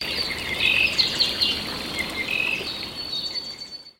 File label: ISLAND STREAM; Spa Retreat